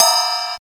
crash one shot d sharp key, Royality free crash tuned to the D# note. Loudest frequency: 4736Hz; Cymbal; drum sample